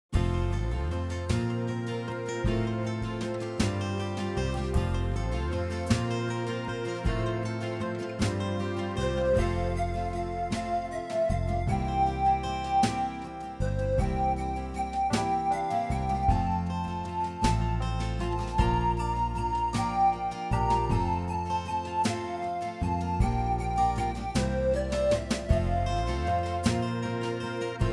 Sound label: instrumental